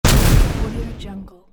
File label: futuristic weapon